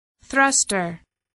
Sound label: thruster